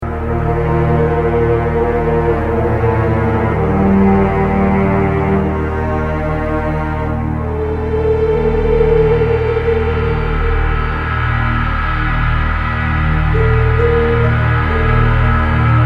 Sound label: Explore Loop; Star Trek Deep Space Nine The Fallen; Kira Explore Loop